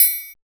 DNC TRI OP, HOUSE KIT; Drums In Your FACE!

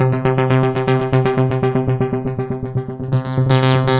philter (seq); Sonic Foundry (Sony Creative Software); Ugly Remnants VOL-2

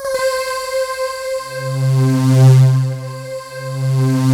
C4, Synth Line; 90 s Sample CDs; NiceBeats; SynthLine VOL-2

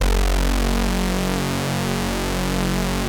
POLY SYNTH; Wizoo - Powered Wave

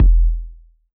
BD T8 Low Long; 155 DJX Kit

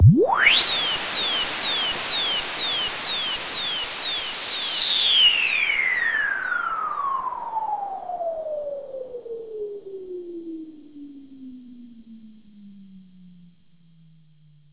SYNTH FX

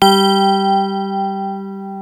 FM MixSy; 90s Sample CDs; Club-50 - Foundations Roland; FM Syns

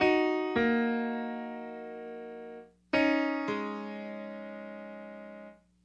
PNO, Hip Hop and R&B Drumloops; Best Service; ProSamples vol.01; AKAI

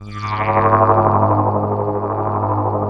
SOFT BUZBY

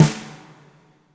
FAT SNR L, WARM BALLAD; Spectrasonics; Burning Grooves CD2

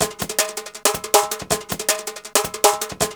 160, DRUMLOOPS

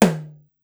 Tom High, Korg Wavestation-SR, Drum Kit; Wave 455 - Drum Kit

